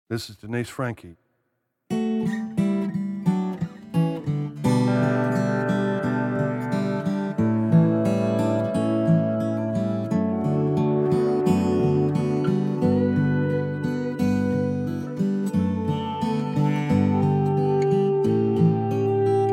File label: blue piano